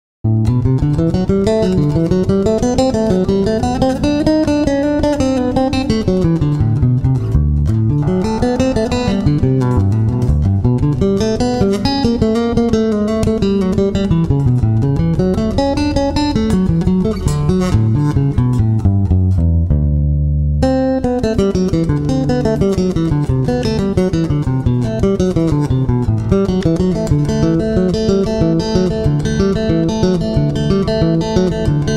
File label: Cello Suite 1 in G Major